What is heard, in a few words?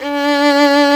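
STRINGS SOLO, Chamber Grp; Roland L-CD702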